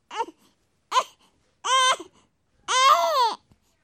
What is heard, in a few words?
wrong